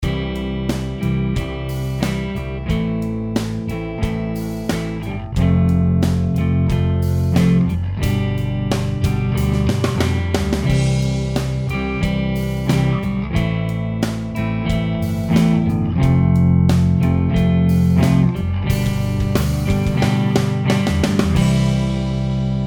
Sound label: rock